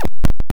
Intergalactic Noise; FLEETCOM; bfxr

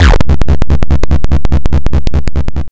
Wub Gun; FLEETCOM; bfxr

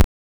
Deep Damage; FLEETCOM; bfxr

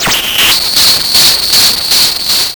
Alien Transmission; FLEETCOM; bfxr

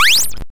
Energy Charge; FLEETCOM; bfxr

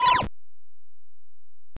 Lost Echo; FLEETCOM; bfxr

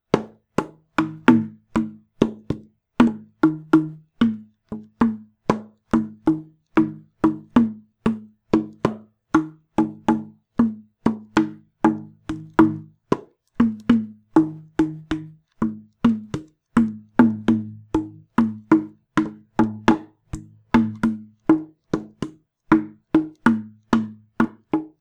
raw music; joke music; shit music

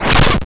Data Corruption; FLEETCOM; bfxr

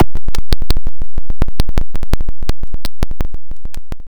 Static Crackle; FLEETCOM; bfxr

